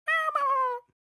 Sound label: grub sound; hollow knight